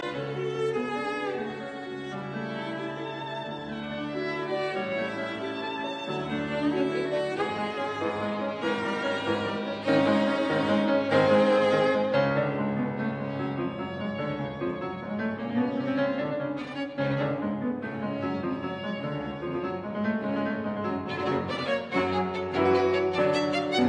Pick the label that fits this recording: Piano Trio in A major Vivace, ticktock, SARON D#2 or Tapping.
Piano Trio in A major Vivace